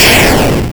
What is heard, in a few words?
Explosion